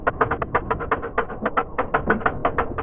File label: crank; Fraggle Rock Season 1 Bonus CD